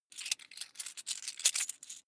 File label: coins big clatter